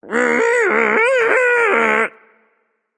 tongue, voicepack; smite; jorm

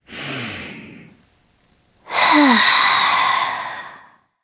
nv breath; charybdis

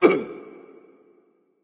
grunt hurt; smite; npc; olympus

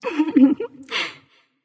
laugh light; smite; voicepack; hel

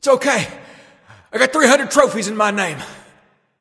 death; smite; apollo

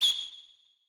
land bird; smite; chaac

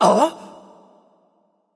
surprised; smite; voicepack; sylvanus

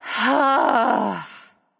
sigh; daji

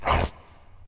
bite; smite; cerberus

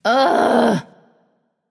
"ugh"; smite; voicepack; nike

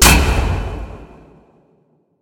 hit large; smite; god odin

